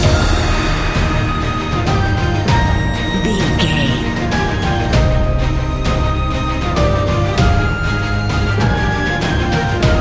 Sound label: epic adventure loop